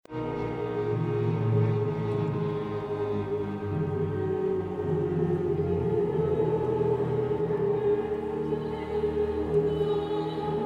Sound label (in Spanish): coro con cuerdas